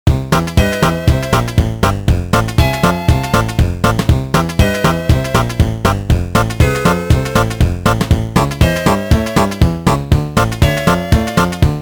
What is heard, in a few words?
Map Screen (Upbeat); SpongeBob SquarePants Lights, Camera, Pants; GBA